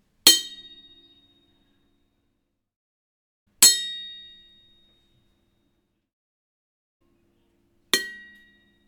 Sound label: Ricochet